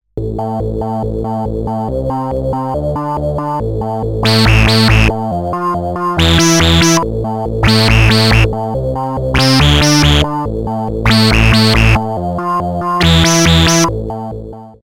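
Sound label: Roland MC-202 Accent VCF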